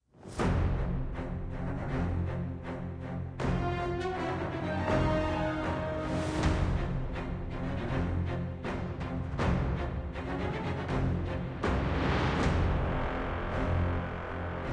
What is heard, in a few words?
enemy move; devils demons android game music